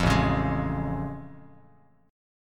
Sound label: A Augmented Major 7th A Bass Standard